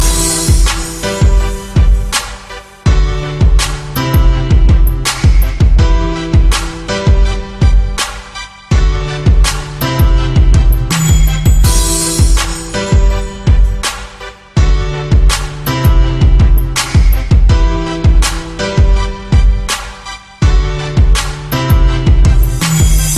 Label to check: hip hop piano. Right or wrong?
Right